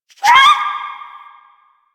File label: Rabbit Ambient Call; Duviri; Animals